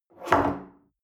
espresso grab from table slide medium, restaurant cafe